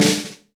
V-Pop Snare Ghost, Roland BK-5; Snares

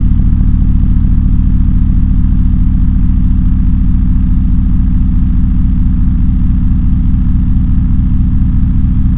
Drone Ambient; Drums & Drones

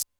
Fst Maracas, Alesis D4 drum machine

